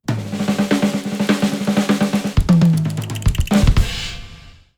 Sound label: Jingle New Weapon Unlock; Black Command